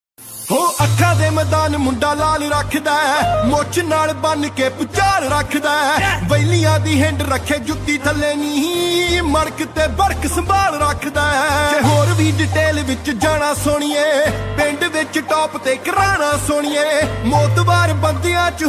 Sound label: Punjabi Beats Ringtone